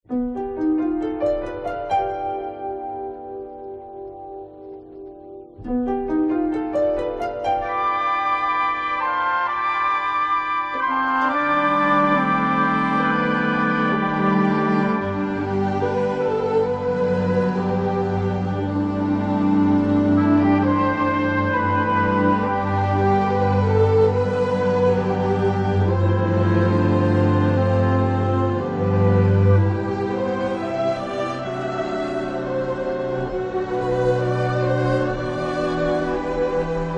Bass Theme

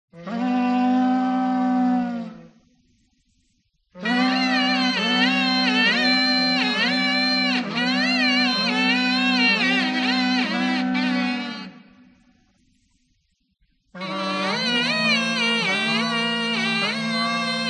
bagpipe

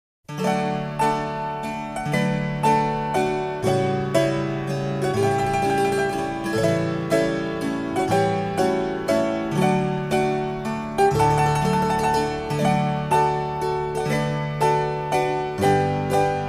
piano source

